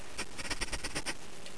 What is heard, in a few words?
sniff